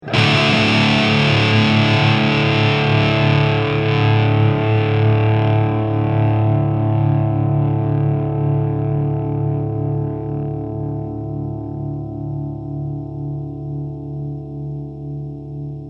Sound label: Sustain Regular Guitar